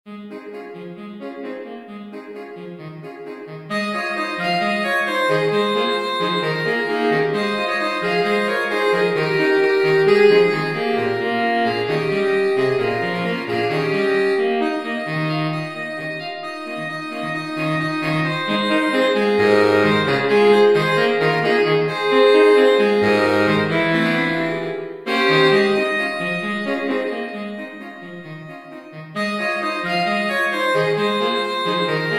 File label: saxophone quartet